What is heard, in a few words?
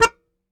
Very Short Note, Melodica